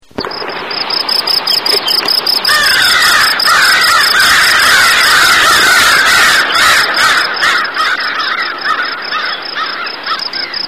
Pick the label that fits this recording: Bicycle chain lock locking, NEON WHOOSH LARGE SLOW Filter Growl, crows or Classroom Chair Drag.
crows